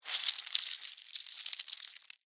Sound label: Insect Move Slimy, Movement, Wet, Squish, Bug; Animal; BlastWave